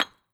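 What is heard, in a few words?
brick, steps; Terrarum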